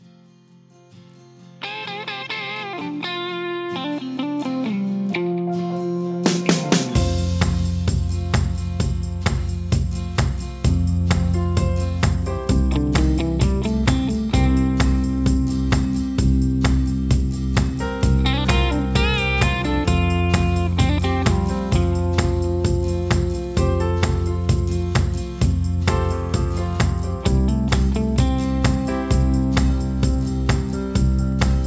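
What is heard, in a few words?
Instrumental with Melody with background vocals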